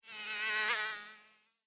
Mosquito Fly By, Mosquito, Fly, By, Wings, Insect, Bug; Animals:Insects; Mosquitoes; Blastwave